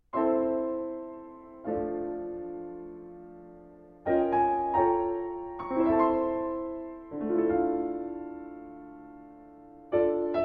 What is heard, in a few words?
Solo Piano